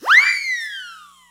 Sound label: shoot the ball; Nickelodeon Basketball Stars 3; ThrillGames